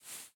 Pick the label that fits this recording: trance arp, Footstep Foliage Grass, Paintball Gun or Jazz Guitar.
Footstep Foliage Grass